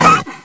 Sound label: Wolf Hurt; Playable Tutorial Level; TR1